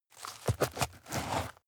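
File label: step dirt heavy boots scuff, footsteps; schritte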